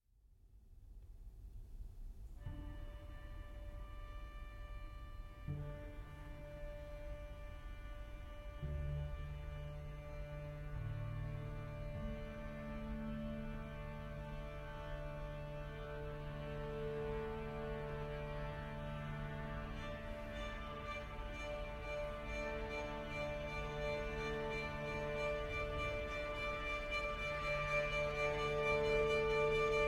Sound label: Cello Octet; Cello Octet Amsterdam Version